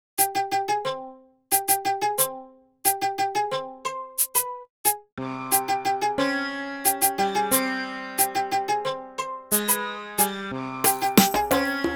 090 Cmin, Hip Hop Exotica

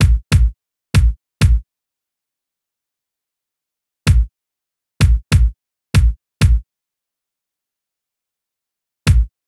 Kick A, Hip Hop Exotica, Drum Tracks, 096, Amin

